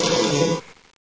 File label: fart toilet